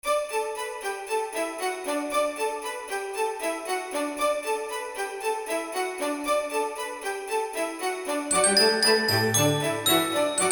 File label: xmas